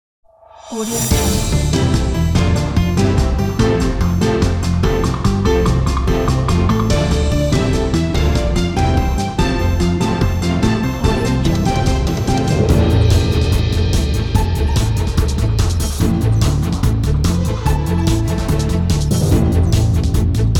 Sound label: tv news headline